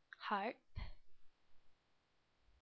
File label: harp